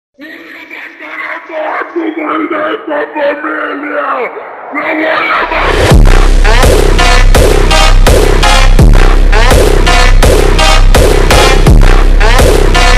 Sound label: funk slowed hardstyle remix